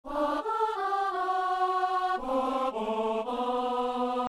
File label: alto; Psaume 127 (128); André Gouzes